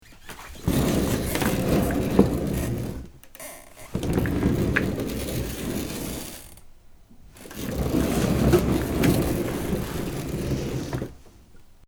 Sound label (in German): Buerostuhl rollen